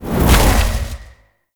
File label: Void Dragon Orb Return; Enemies; Abilities; Warframe; Duviri